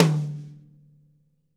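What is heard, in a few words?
mid tom rimshot; 90s sample CD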